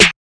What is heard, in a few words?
Snare, Hip Hop Kit; drums; STYLE KITS (Hip Hop, Etc); Khezie Hip Hop Kit